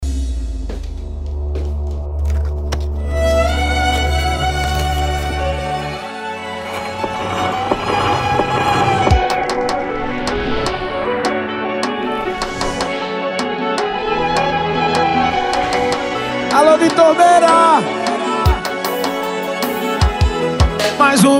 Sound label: sertanejo; Impacto Sound Esp. Sertanejo